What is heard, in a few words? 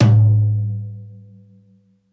floor tom